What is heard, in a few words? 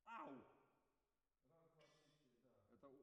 Alto, RNR for piano